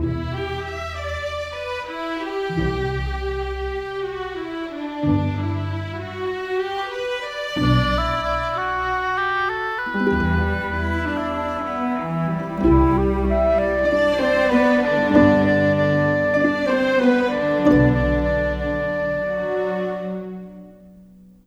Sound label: Rock Pop, Orchestral; Sonic Foundry (Sony Creative Software); Orchestral Series VOL-4 - Rock and Pop